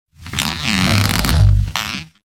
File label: Jail Cage Swing, gnarled forest